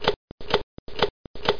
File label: pickup